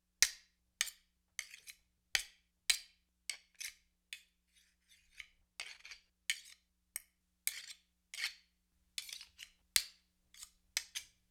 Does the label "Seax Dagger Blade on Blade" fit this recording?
Yes